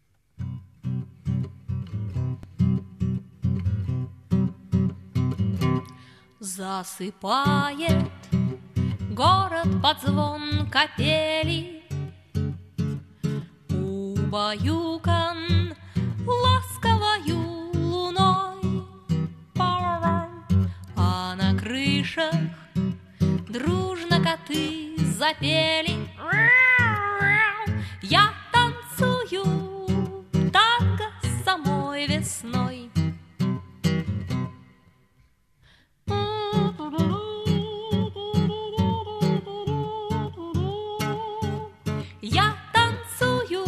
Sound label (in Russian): tango